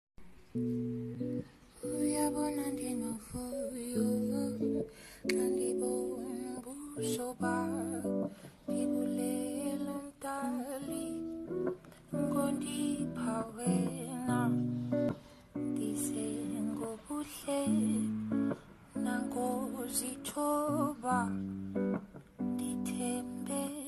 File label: Acapella